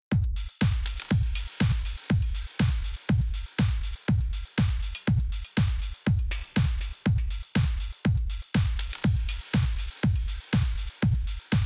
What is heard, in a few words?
Drumloop DRY